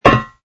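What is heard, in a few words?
footstep steel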